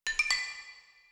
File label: warning